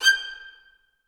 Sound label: G5, Orchestra; AKAI Advance Orchestra Upgrade 97 Vol.1